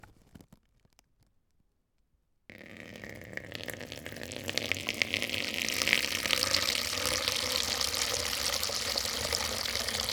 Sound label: Water